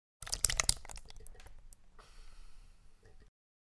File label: Spew Splatter